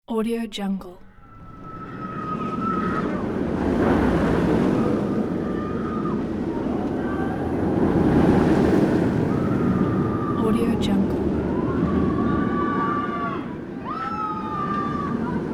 people screaming at rollercoaster; fxprosound

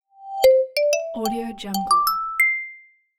ringtone

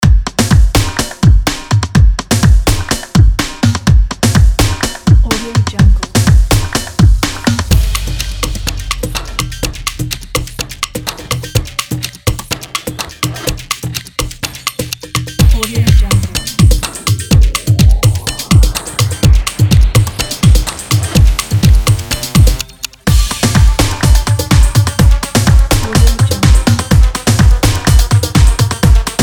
drum on

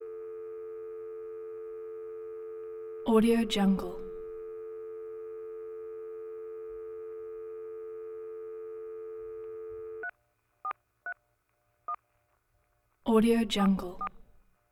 phone wrong number message dial tone beeps

